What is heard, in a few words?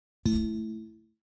Duplicate Item Added; Blutag; Dreamr